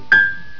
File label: piano note